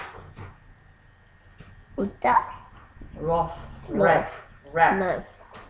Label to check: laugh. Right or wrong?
Right